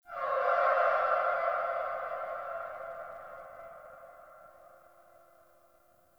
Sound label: Sentient Lair Spirit Chatter Three B; Warframe